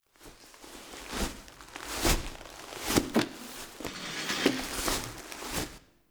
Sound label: Crawl Foley Start; Dax; Warframe; Cinematics; The New War; Lua War Room